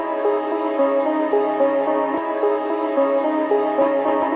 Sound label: 110 mystery fog piano melody; melodic loops; keys organ piano loops